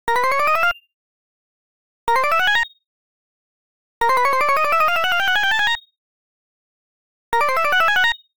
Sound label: Arcade Click Neutral; boom library; The Interface